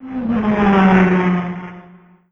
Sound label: Kawai K5000W Race Car